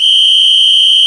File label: Samba Whistle